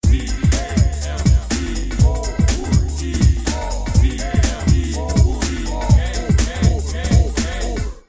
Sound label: Gameover